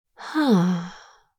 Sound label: Adult female relief moan